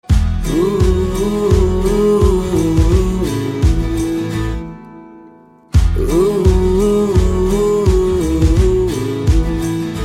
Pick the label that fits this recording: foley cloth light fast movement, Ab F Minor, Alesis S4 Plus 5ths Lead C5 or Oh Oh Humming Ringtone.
Oh Oh Humming Ringtone